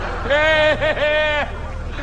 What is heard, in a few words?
hehehe